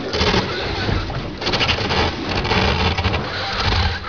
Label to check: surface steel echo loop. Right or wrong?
Right